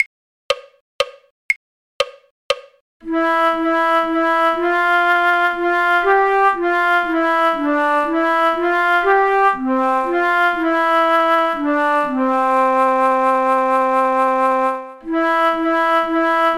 flute; drink to me only with thine eyes; folk

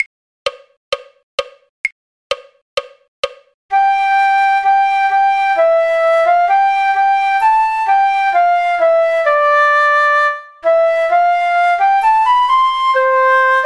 flute; all in a garden green; folk

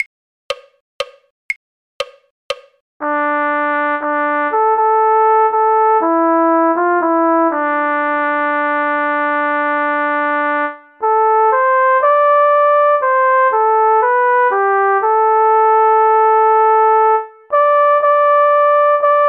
cornet; scarborough fair; folk

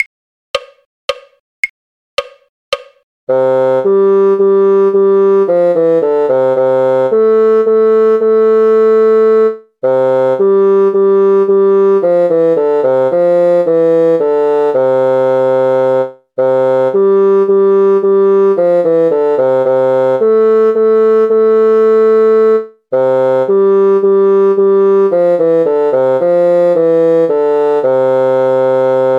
bassoon; lavenders blue; folk

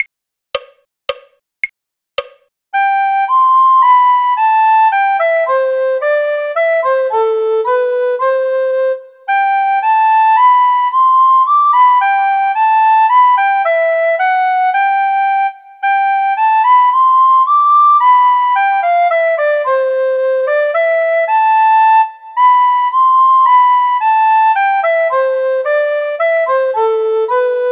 recorder; Sweet Polly Oliver; folk

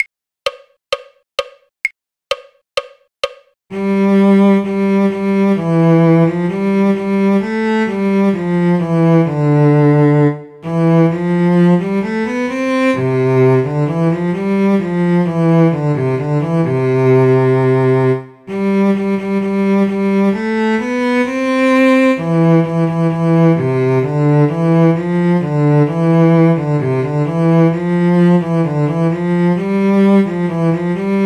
cello; all in a garden green; folk

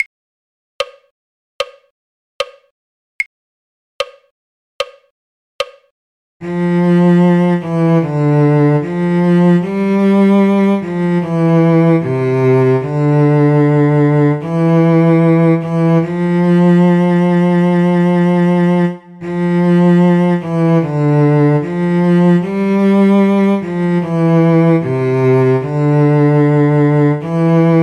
cello; folk

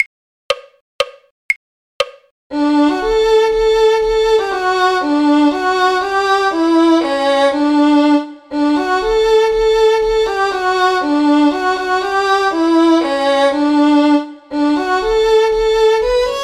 violin; carols

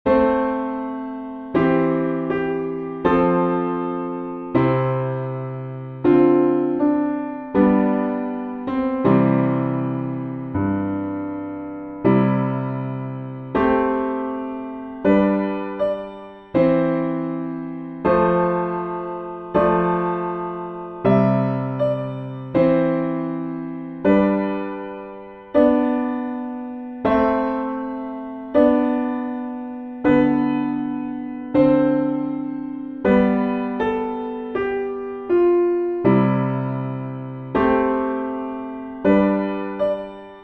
piano backing, hymns; Holy Holy Holy

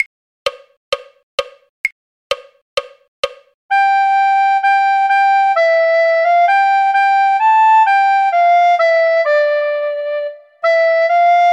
recorder; all in a garden green; folk

